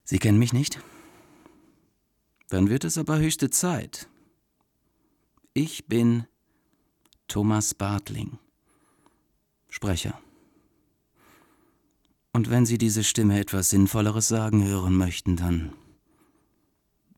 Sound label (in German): Stimmung cool